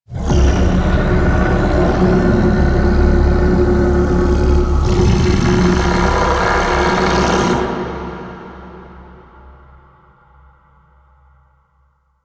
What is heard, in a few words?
Sand Vocals; Warframe; Man In The Wall